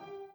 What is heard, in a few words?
b piano